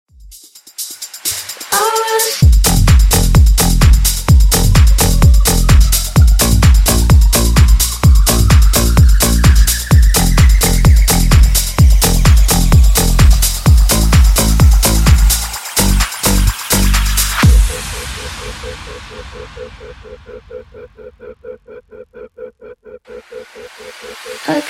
Funk 128Bpm; Bootleg

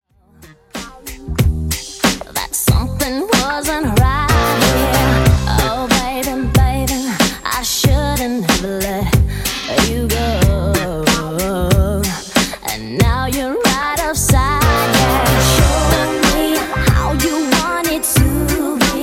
90s DJ Friendly Redrum 93bpm

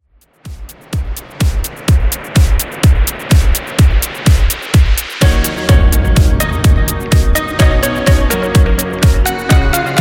Club Extended 126Bpm

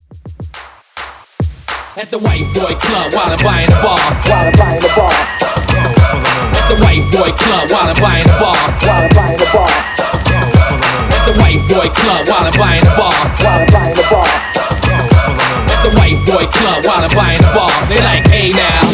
Epic 105 BPM

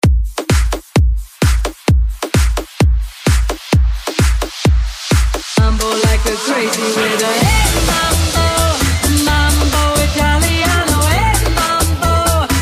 future sax house 130 bpm

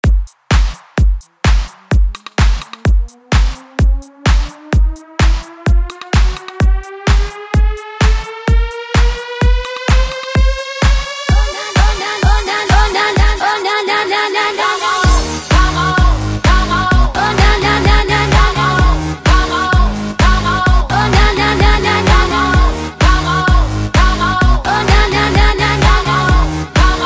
mashup breaker 128 bpm

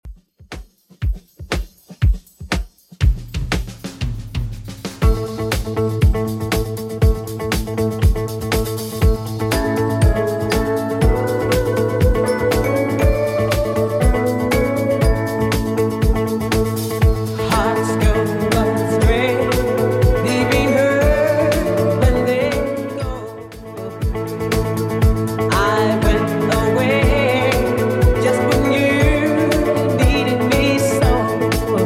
70s Redrum 120bpm

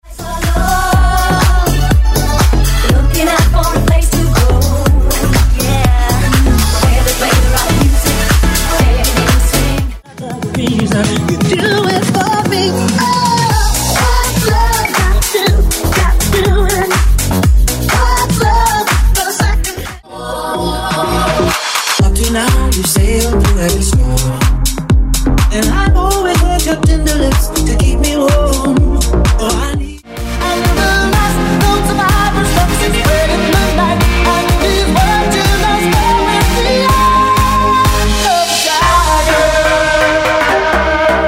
70s 80s 90s Dance House Megamix

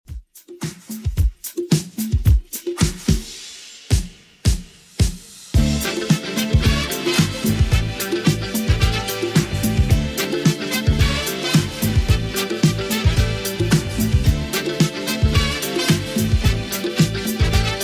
80s Redrum 110bpm

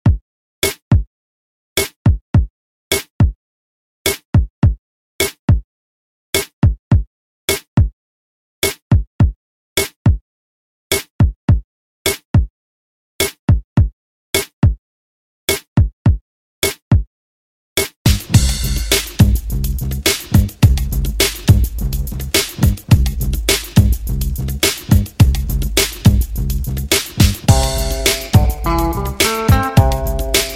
Funk Motown, 105 Bpm; Redrum

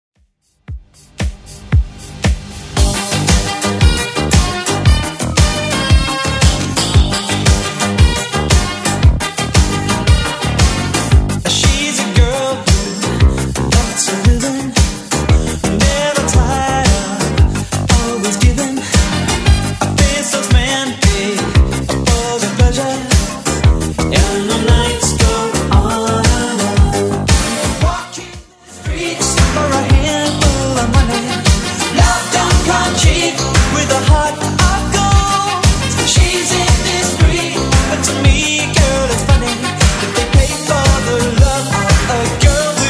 80s Redrum 115bpm

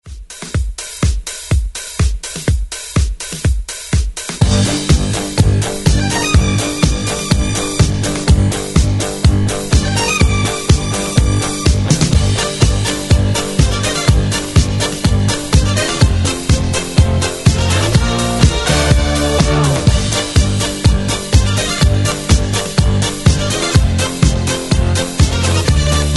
70s Re Drum 124 Bpm; DJDOLLS

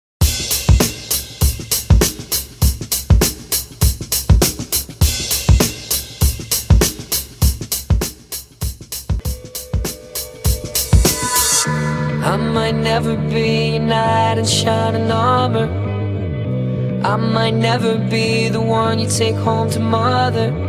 REDRUM 100 BPM

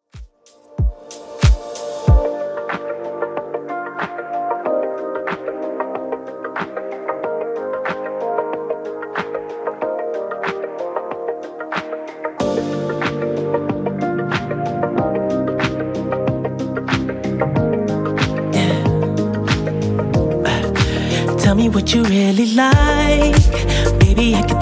93bpm; Redrum

